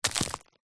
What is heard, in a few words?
mud dried ball drop break; zapsplat; foley